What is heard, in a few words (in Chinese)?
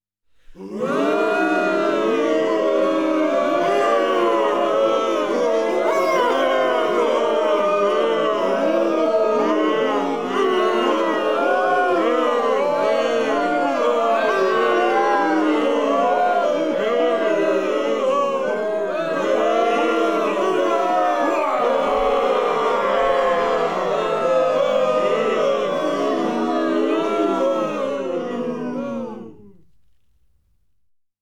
众人(成人)做嘘声(长声)